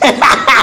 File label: headshot; loftus